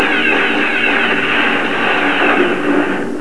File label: laser; Battlestar Galactica; Laser Sounds